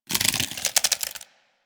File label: Armadillo Foley Scales Roll; Warframe; Hunting Critters